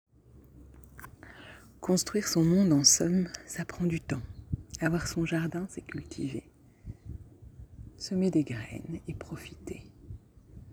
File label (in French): ahahaha